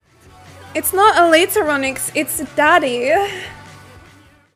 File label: applause; Eliteronix